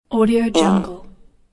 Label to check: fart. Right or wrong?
Right